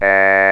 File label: EEE, ELEC VOX; East West - Global Sounds